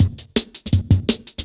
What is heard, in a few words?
Skippy Cut, Drum Loops, Jungle; Zero-G Jungle Warfare 1, 2 & 3; Skippy A 165.5